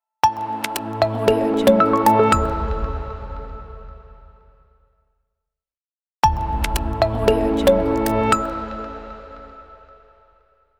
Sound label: corporate logo